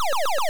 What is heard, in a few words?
Minimize; Asteroids Deluxe